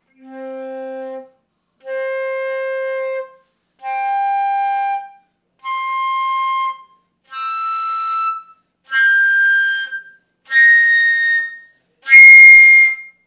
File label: flute harmonic